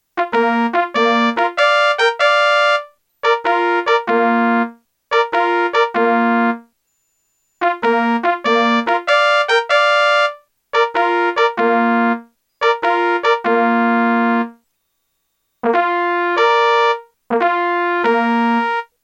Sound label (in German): Dachs tot 2stimmig